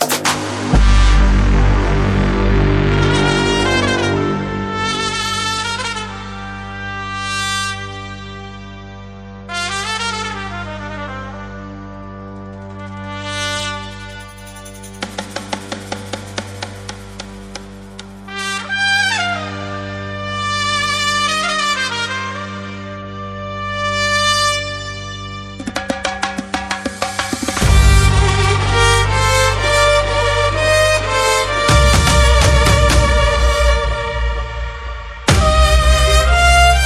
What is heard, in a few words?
Afro House